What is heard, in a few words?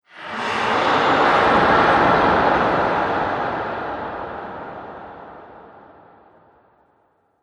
Hell Gate Deathly Evil; Evil Sound Effects; Andrew Fleming